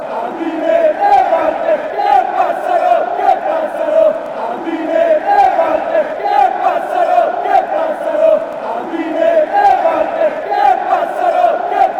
lazio chant

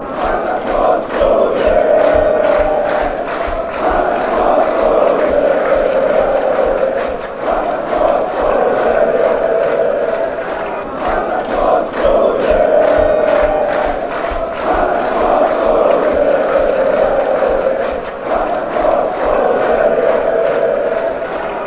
chants; spartak moscow oleee

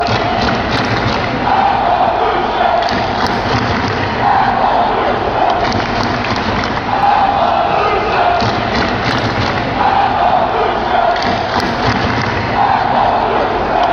revolution claps, chants

